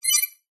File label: science fiction computer console beep button press